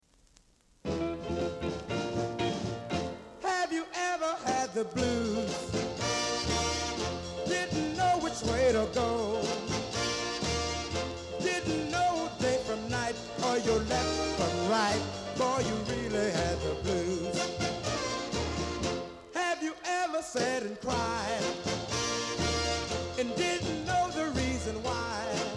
blues

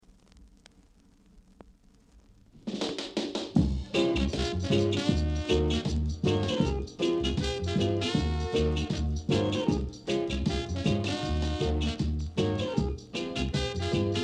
groove

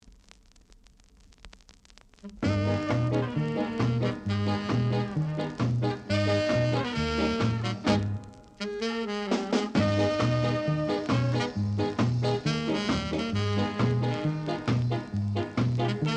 jazz

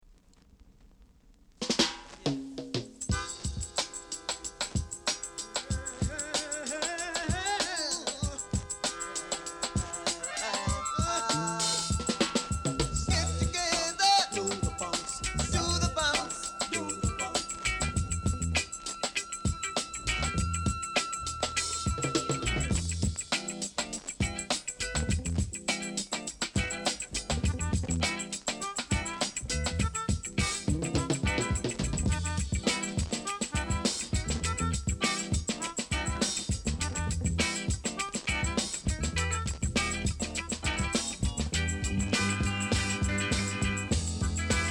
funk

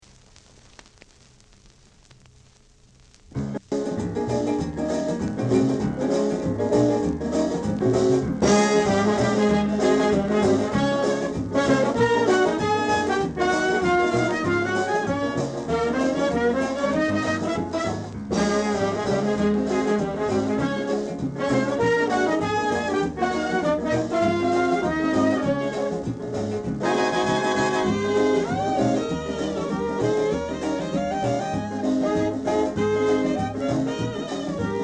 melody

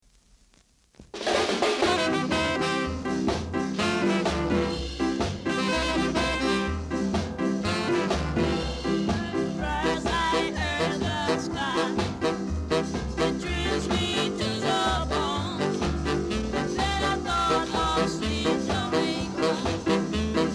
beat

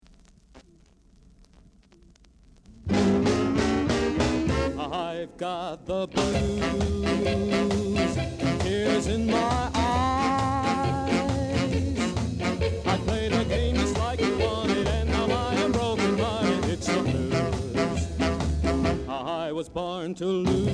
blues

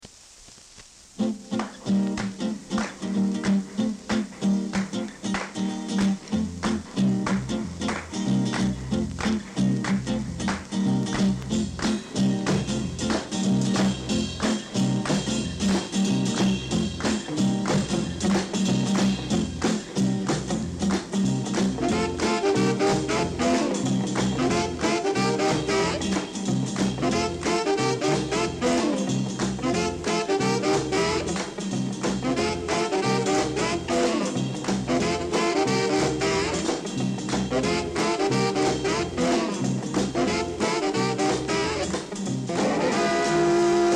pop

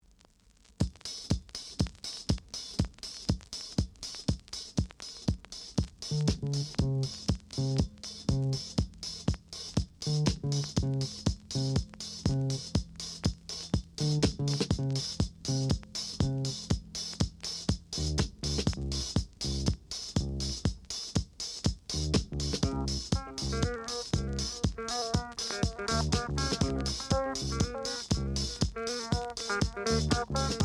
disco dub

